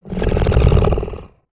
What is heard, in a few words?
Snore Out; creatures pets